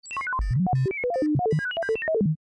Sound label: robot scan; time-lab